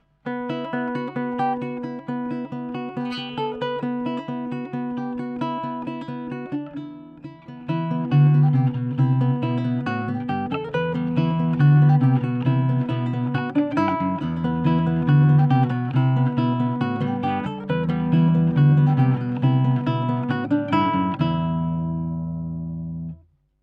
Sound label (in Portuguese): bandolim